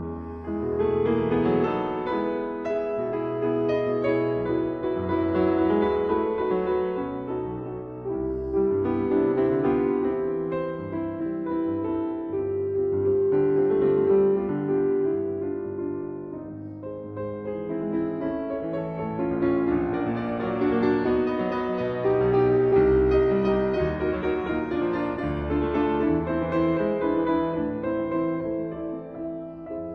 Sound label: Etude in D flat major; 3 Nouvelles etudes Op posth; Juana Zayas